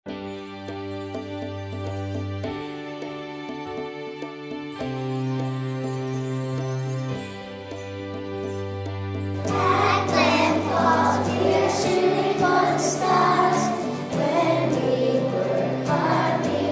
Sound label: School Song with Vocals; Glen Falls